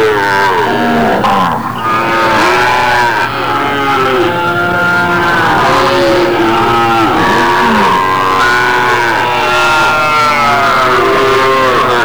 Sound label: call, cow